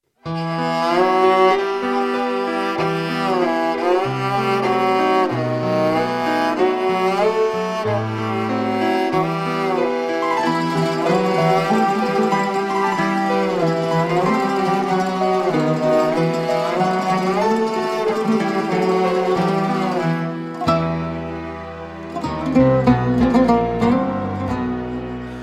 Mystic Emotional

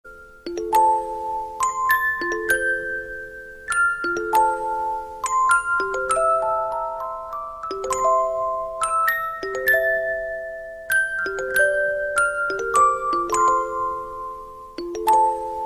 Baby Music Box

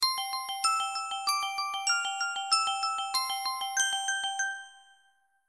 Chiming tone

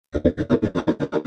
Slowing Audio

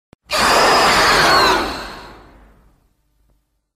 Roar; Destroyah

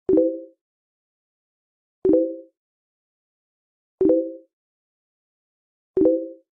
Facebook Chat Sounds

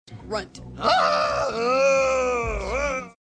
Grunt

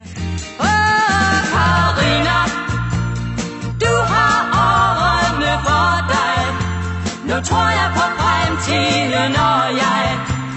aah

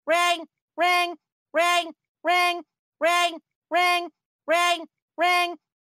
Ring Ring; Angel Dust

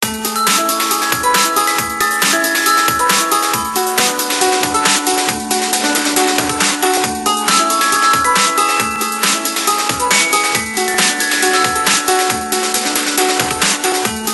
Iphone Ring Ton

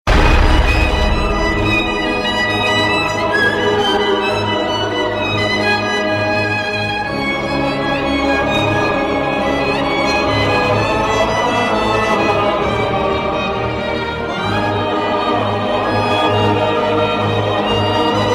Horror

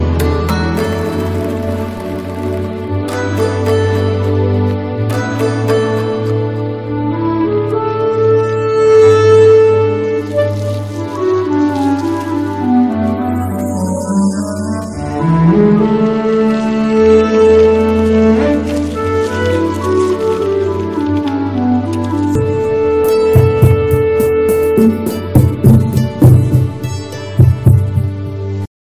Love Flute; Bahubali; meloboom

